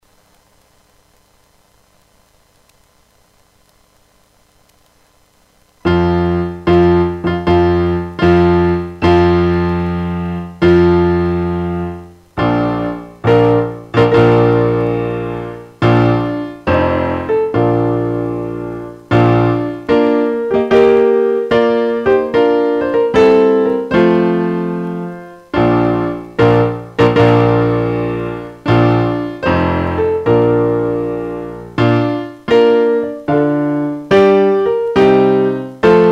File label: Lohengrin Wedding March